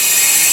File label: VLN SHIM, FAIRLIGHT CMI IIX STRINGS